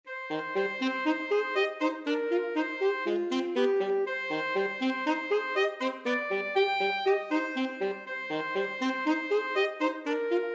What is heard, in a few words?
alto saxophone duet